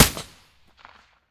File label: aps silencer stereo; STALKER; IWP